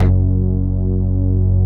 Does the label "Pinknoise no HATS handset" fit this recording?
No